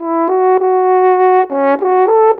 Horn, Orchestral, Rock Pop; Sonic Foundry (Sony Creative Software); Orchestral Series VOL-4 - Rock and Pop

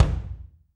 BD, Drums; Bob Clearmountain Drums I

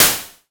SNR, Yamaha TX7 synth drums

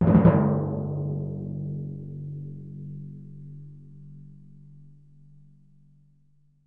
TMP, Synclavier Percussion

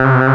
BEND C2; Analogon

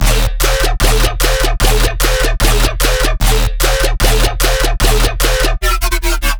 bass loop 150 D#; healthybreakfast; Virtual Riot

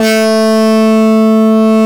TURBO; NorthStar - The Wizard VOL-2; TURBOTRONIX

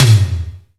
AMB TOM

